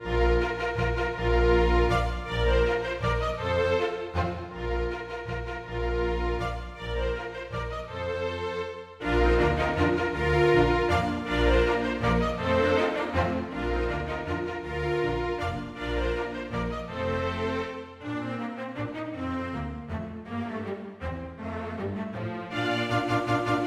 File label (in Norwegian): Menuet for strykekvintett; Aamodt